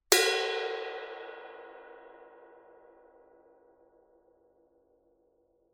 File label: master 20 extra dry ride bell, paiste; masters